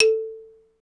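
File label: XYLO, xylophone; ORCH PERC; Roland - LA Composer vol. 1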